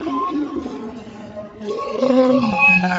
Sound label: soundstream music lyra 3kbps truncated